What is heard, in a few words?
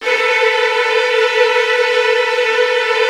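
Virtuoso Strings, violin trills, A2; ILIO; Kirk Hunter Virtuoso Strings VOL-1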